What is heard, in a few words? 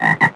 FROG; animal fun